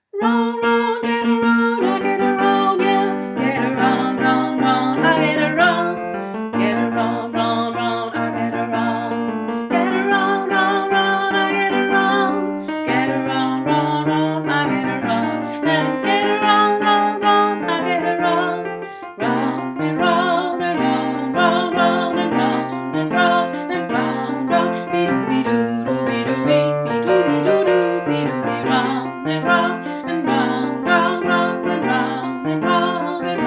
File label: Bass